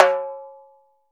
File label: H-TIMBA, TIMBALES